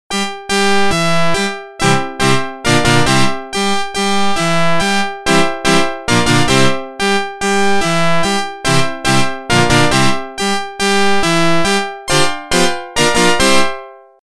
Organ; NHL 94

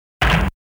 Regular Attack Sound; Saint Dragon

